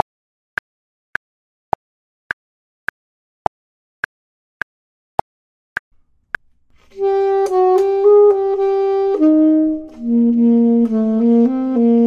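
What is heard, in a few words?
bottom, Saxophone and Clarinet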